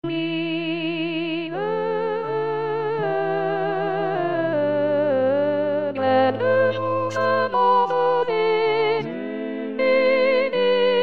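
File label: soprano; Mille regretz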